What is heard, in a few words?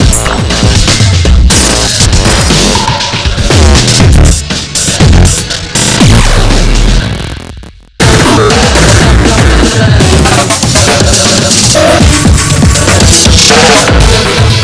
dum, 240bpm 16 measure